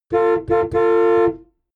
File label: car horn chopped interior hummer h3